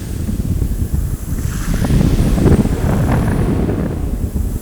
sea; RSS SEA

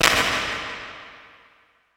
GYM CLAP; E-MU Formula 4000 Series Vol. 3; Analog Odyssey; Electro Perc

